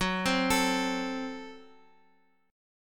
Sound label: Gb Diminished, down, Guitar, Open F; arpeggiated; JGuitar